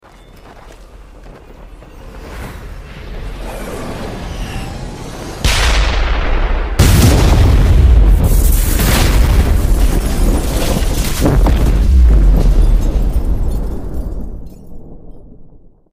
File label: serious punch; saitama